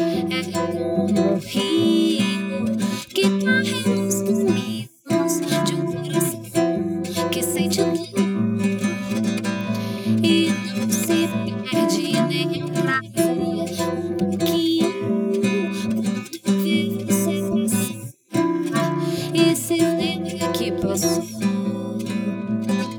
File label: guitar; SiSEC11